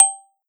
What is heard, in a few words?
marimba G5 DING